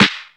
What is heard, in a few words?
original steel snare drum sample g key